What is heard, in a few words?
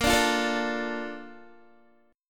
A sharp Major 7th, Guitar; strummed